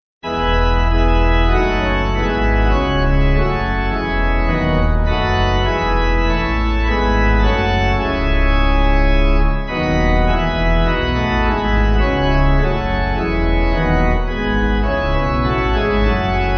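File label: Pipe Organ; O Word Of God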